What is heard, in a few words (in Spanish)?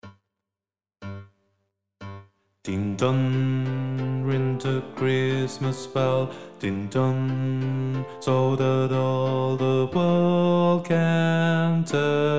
BAJO, SHOW CHOIR; ADIOSES SHOW CHOIR; Navidad 24